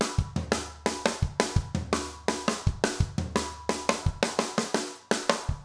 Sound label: jungle 170 fill